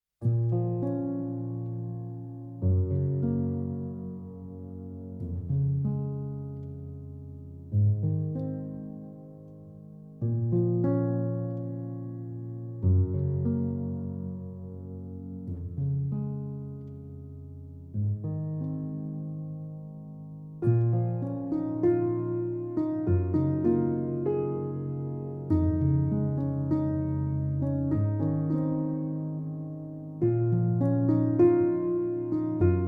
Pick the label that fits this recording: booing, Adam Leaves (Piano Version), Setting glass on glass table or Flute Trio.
Adam Leaves (Piano Version)